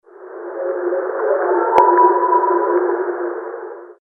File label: Call